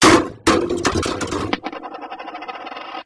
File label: throw box